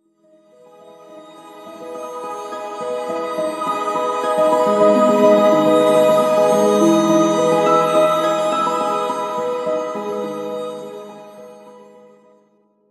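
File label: song of discord melody; sona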